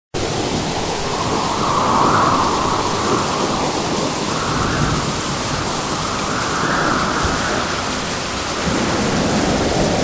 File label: storm sounds